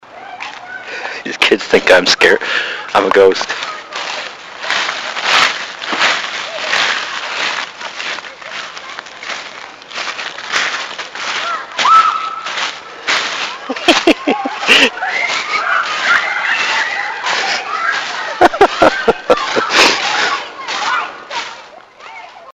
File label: haha